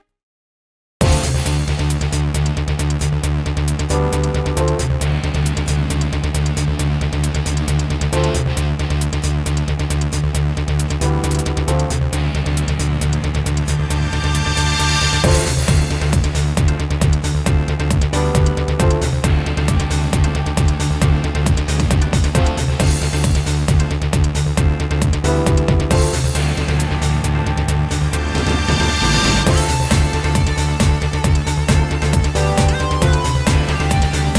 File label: Turbo Duel Music; YuGiOh Over the Nexus